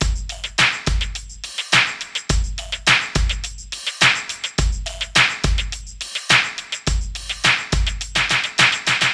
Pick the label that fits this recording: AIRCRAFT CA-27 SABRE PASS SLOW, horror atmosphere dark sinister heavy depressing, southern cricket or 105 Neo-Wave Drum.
105 Neo-Wave Drum